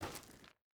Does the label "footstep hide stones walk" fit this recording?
Yes